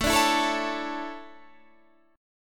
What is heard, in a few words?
D Major 7th Suspended 2nd